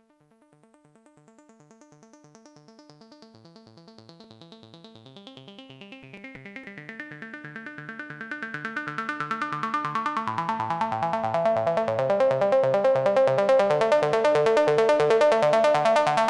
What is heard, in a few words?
lead synth